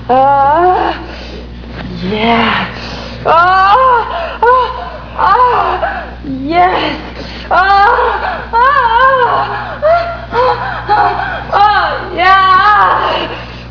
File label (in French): orgasme